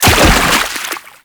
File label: Land Heavy Water, Footsteps; Enemies; Warframe; Teshin